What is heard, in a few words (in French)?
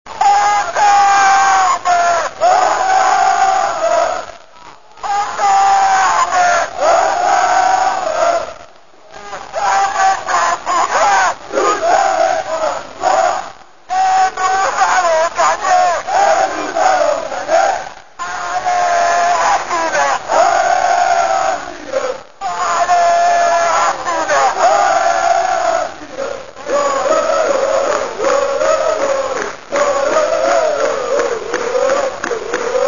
aux armes, Chants; Strasbourg